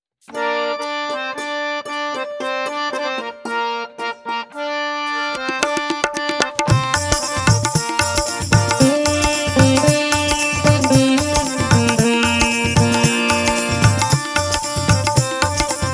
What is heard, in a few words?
Gents Karaoke A Sharp Pancham